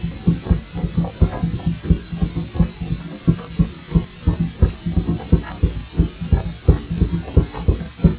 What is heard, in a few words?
percussion